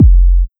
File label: Kick Spiral